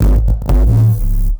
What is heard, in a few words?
F-D Distorted Bass Tone; neuro; BIG5AUDIO